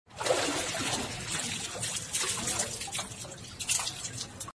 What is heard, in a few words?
water well; چاه آب